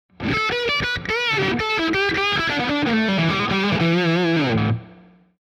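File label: P90 Soap Lead, guitar pickup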